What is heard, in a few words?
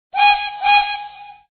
whistle